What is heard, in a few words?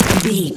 footstep ice crunchy land